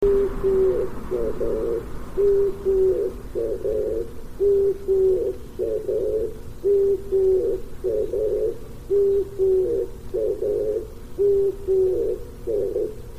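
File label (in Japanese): kijibato, oriental turtle dove; kubo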